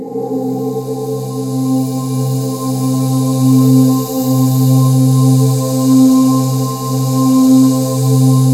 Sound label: FEEDBACK, JV-1080; Syntec; Wall of Sounds